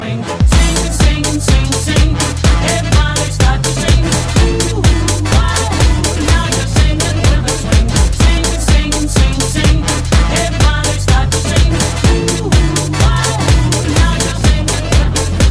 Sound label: Swing